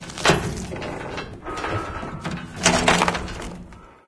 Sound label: stalker shakes; ambient; source engine; episodic